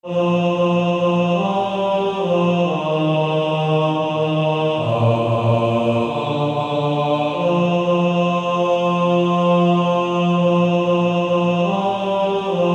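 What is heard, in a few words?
Male Choir, bass 1; Only a step to Jesus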